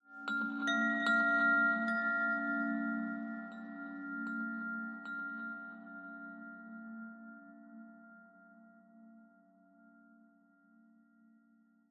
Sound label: ambient abstract chimes high; Half Life Model Pack